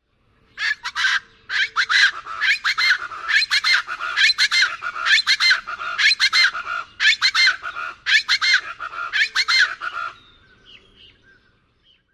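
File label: female close up, Plain Chachalaca; TX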